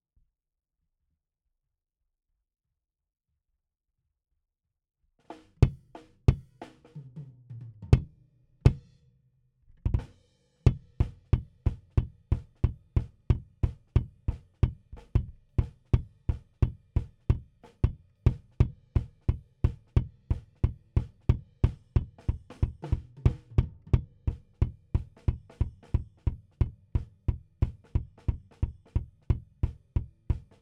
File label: Kick In, RNR for piano